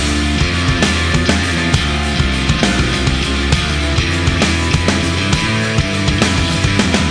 SONG BURST

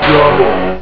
double, Darts; DevilDarts 2.0 FAT

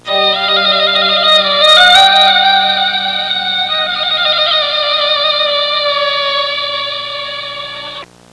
arabian; Sound Library 2000; Wayzata Technology; Music In

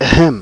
ahem; TimeTracker 2.9

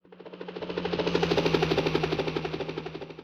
e-mail

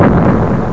Xull Destroid; PlayerPRO 4.5.1; MADH Library 4.01

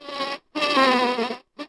insect; DAoC

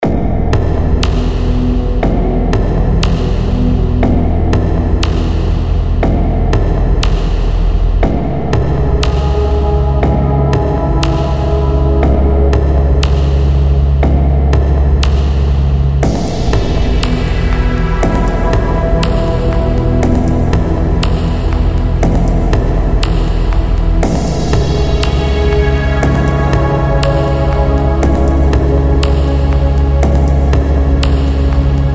cool sound; parasite-x

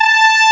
horn fren; Atari Mega Archive

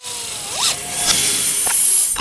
ship ring open; Earth and Beyond; EA Games

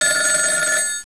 telephon

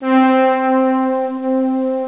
SYNBRASS 2; Dark Forces Demo; Dark Forces Instruments

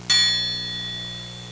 triangle hit; Sound Effects for Multimedia - Volume 1

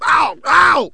PAIN; REDNECK; RAMPAGER

